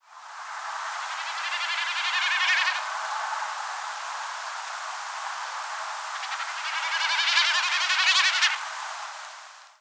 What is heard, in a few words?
Gallinago gallinago